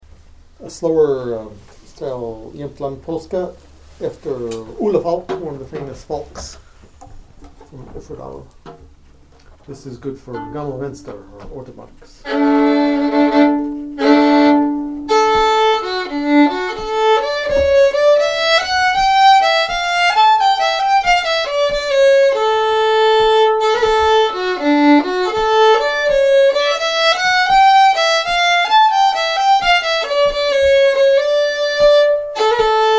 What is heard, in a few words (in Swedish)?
Polska; efter Ole Falk; jamtland